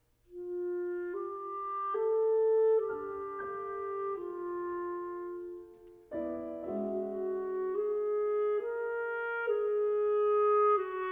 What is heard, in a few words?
Sonata for Clarinet and Piano - Mvt. 2. Andantino, vivace e leggiero